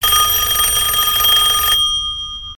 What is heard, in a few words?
Dring Ring